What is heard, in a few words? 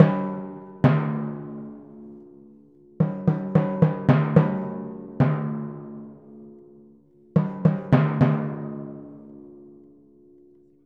110 F#-D-C#, timpani; PowerFX; Downtown Orchestra